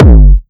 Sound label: heavy drum